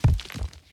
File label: Crater Tower Rock; unsighted